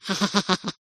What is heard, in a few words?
cartoon laugh high pitched